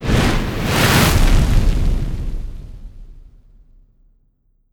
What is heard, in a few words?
Infernal Flame